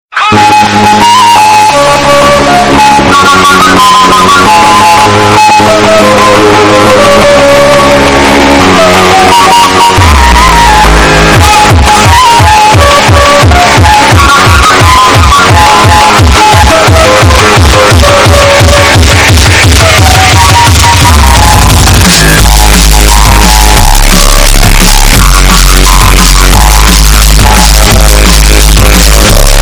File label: hardcore flute